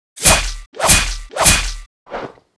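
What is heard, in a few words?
Mob attack; Bossmob; 욱일승천류; 귀장 아베; 2단계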